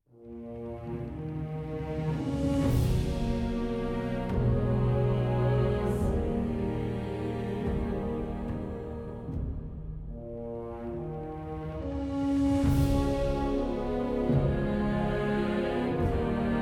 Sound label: mus event positive; opal